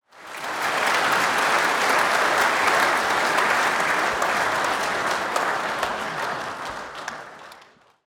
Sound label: applause